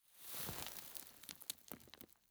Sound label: sand basket; ambient; Counter Strike Model Pack; CS:GO; dust2